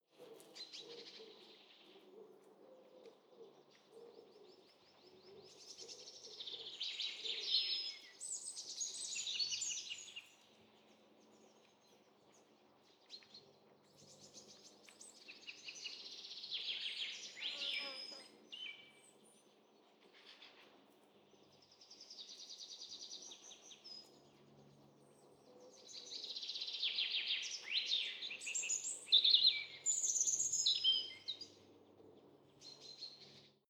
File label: day birds